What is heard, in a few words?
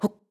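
Climb, voice; BOTW; Breath of the Wild; Link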